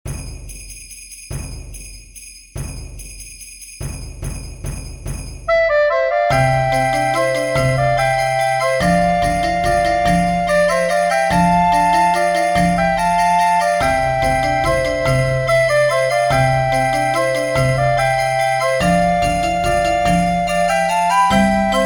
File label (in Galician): Xota Conxunto Instrumental